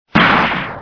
bomb; monkey2; PromptInvasion

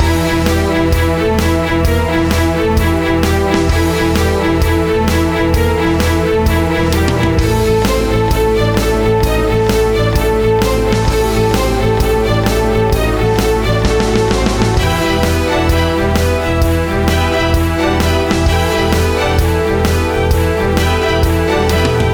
Battle Theme; MonoGame; RolePlayingGame

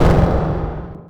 Boom; 3DSoftwareRenderer-DFSPR

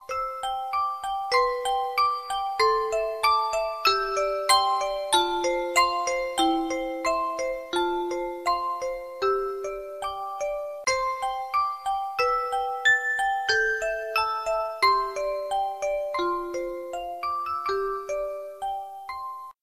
musicbox

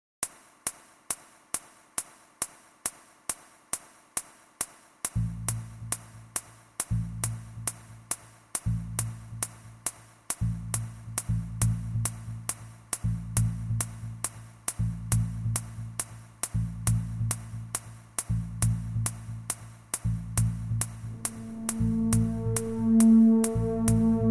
ending; blitzmax; digesteroids

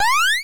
jump; monkey2; PromptInvasion

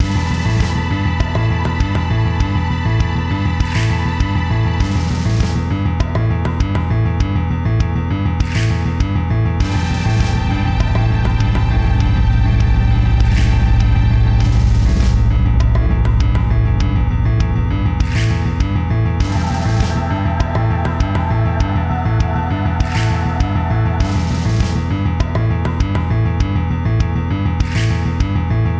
Dungeon Theme; MonoGame; RolePlayingGame

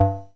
beep; blitzmax; hitoro

